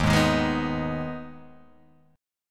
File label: D sharp Suspended 4th Sharp 5th, Guitar, Half Step Down